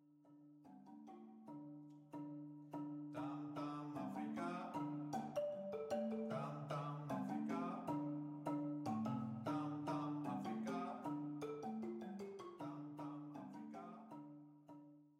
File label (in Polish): TAM-TAM AFRYKA